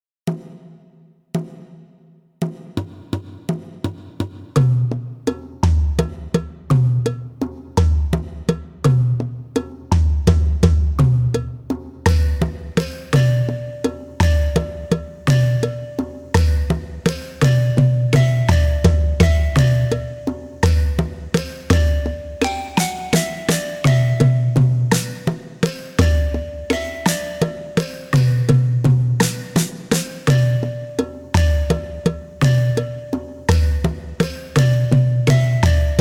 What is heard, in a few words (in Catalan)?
cercle de tambors